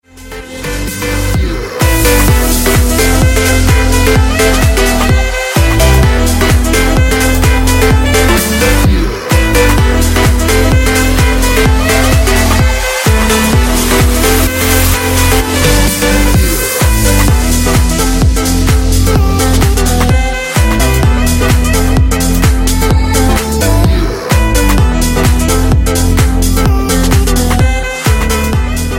bass future

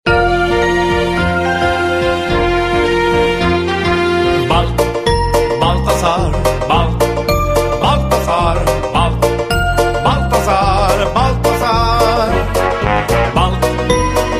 ringtone; children

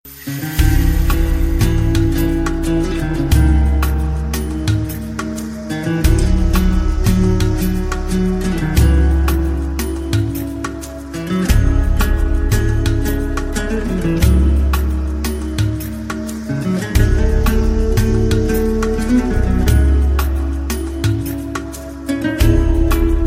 Soft guitar

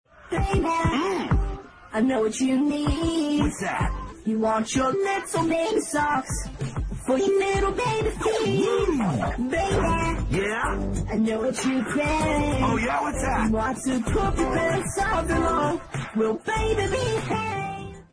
auto tune song

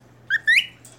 Cricket Bird Chirp

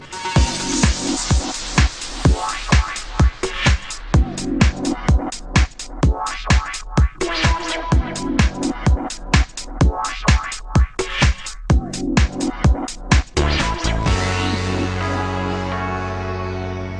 Cool Sony Tone

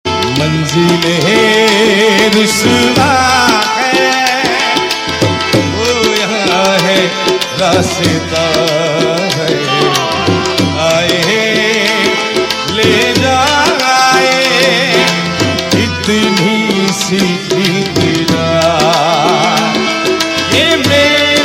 Devotional

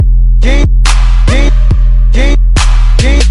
Jingle Bells Hip Hop

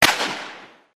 gun sound; ringtones

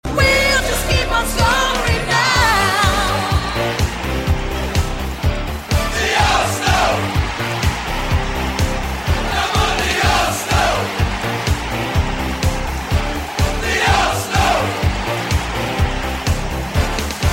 arsenal song

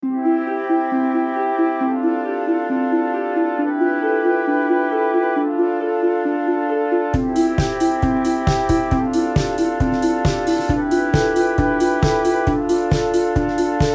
Gentle wake up

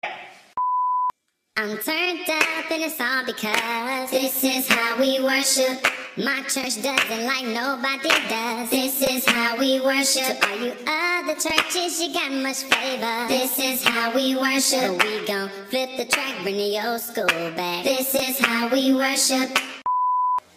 choir ah

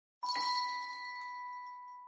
Magical Ding

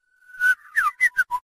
Whistle Reverse

